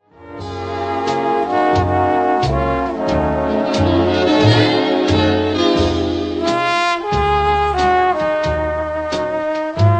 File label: Swing Ballad 90bpm; teaching listening